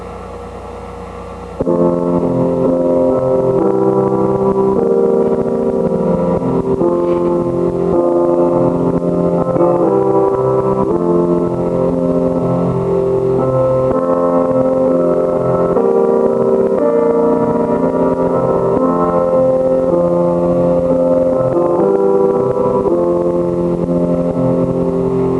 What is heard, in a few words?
piano